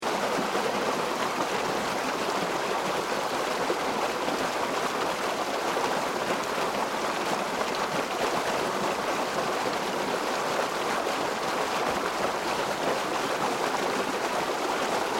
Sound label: Nature Countryside Waterways Weir Canal Water Behind Sluice Gate Strong Fast Flowing; Tom Hutchings